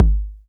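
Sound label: bass drum 808 Click Color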